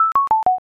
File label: score down